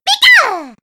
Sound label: Celebrate; pokemon reborn e19 2022